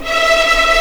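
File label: E5 tremolo p violin left, violins tremolo; East West; The Ultimate String Collection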